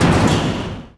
door close metal garage end

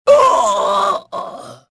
death

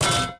pipe hit metal; objects; Star Wars Obi-Wan; XBOX

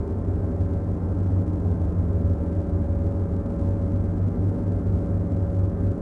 amb hangar; Star Wars Obi Wan; XBOX

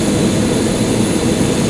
jet engine; Soldier Of Fortune II Double Helix; XBOX; ambience

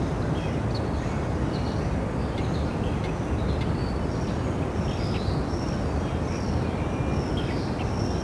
waterfall; theed; Star Wars Obi Wan; XBOX

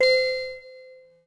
menu select; Pump It Up Exceed

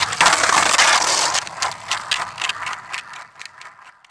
board bail, skater; Tony Hawk's Project 8; XBOX

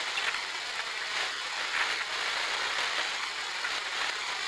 console static; electrical sparks

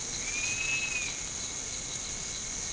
swamp; Ambient; MX vs ATV Unleashed; XBOX

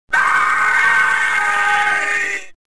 death; Star Wars Jedi Knight II Jedi Outcast; XBOX; reelo

